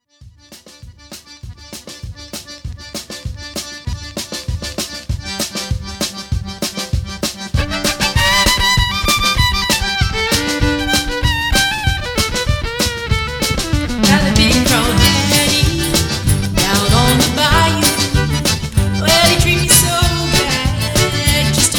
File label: Cajun Zydeco Band; Orlando